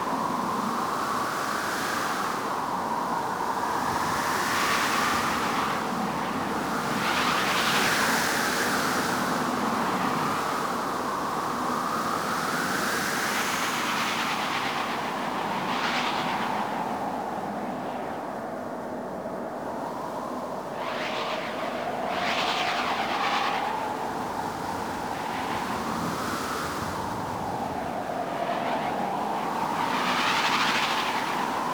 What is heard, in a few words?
Gas City Medium Exterior Wind Amb; Warframe; Corpus